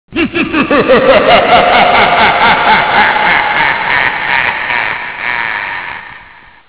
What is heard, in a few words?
evil laff